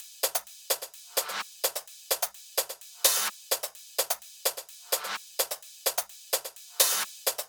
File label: 128BPM Kit; Vengeance Samples; Mars Kit